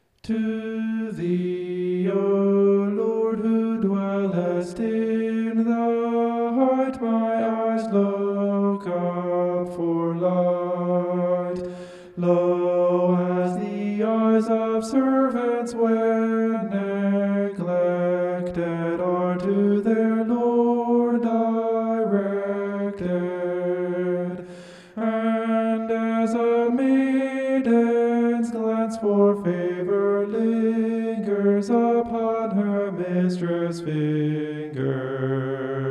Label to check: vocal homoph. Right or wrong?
Right